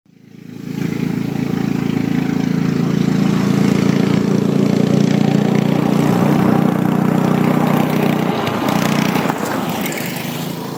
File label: go cart